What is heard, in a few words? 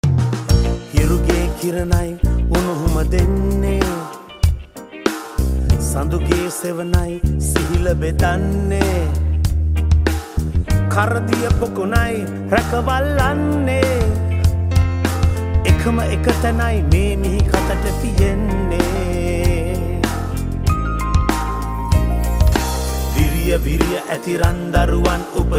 Song; Sri Lanka Cricket Song